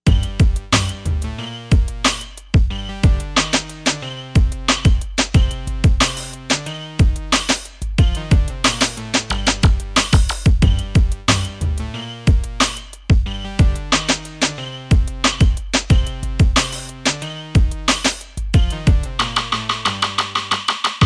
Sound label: CRUNCH, AKAI XR20, Factory patterns